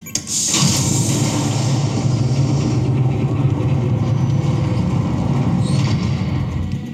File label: Door